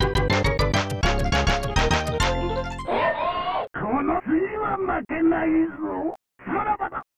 Bonus Win; Cosmo Gang the Video; SNES

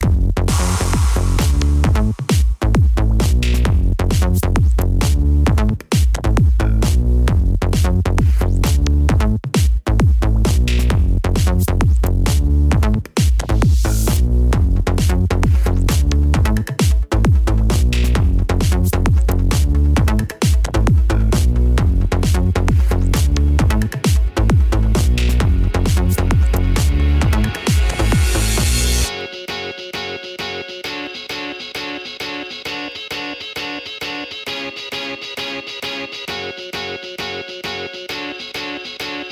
Launcher Music; Windows

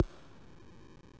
UI Button Down; Trainyard Express; ios gamerip

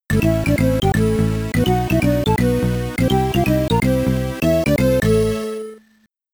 Bonus Round Clear; pp hammer; amiga

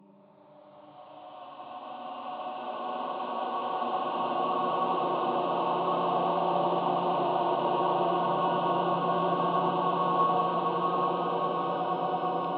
Drone; soma gamerip 2015

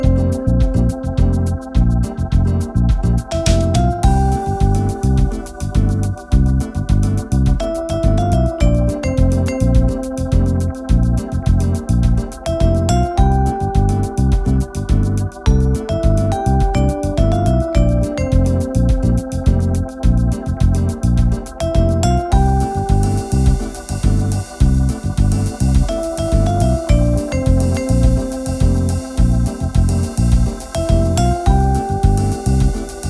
Shop Music; Five Nights at Freddys; FNAF Special Delivery; android ios

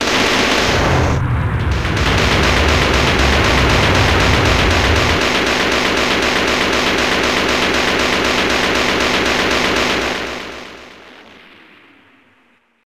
Exploded; P-47 The Phantom Fighter; Mega System 1; arcade; Bismarck

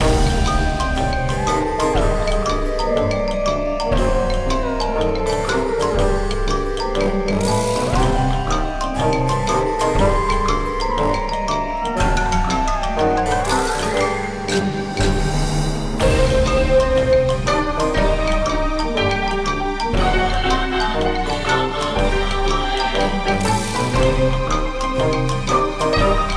Menu Loop; rakoo friends wii u 2015

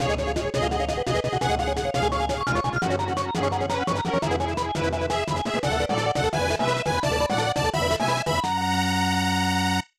Battleship Destroyed; Star Fox 2

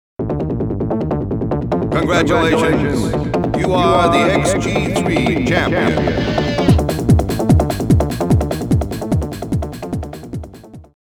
Congratulations!; Extreme-G Racing XGIII; GameCube

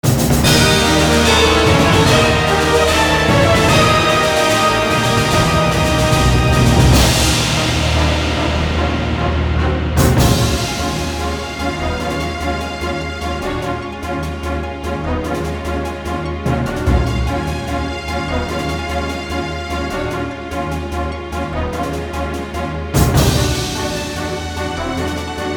Victory!! (Win); Space Invaders Get Even

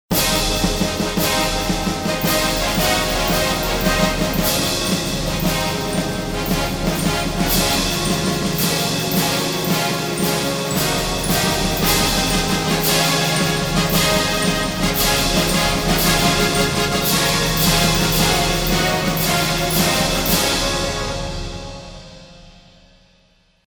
German Win

